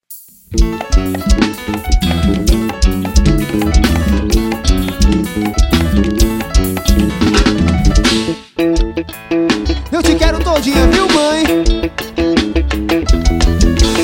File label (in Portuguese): ao vivo carnaval; carnaval 2009